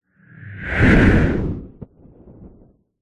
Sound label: science fiction space junk debris zoom by; zapsplat